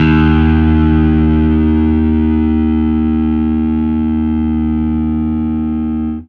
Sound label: Fuzz D sharp 2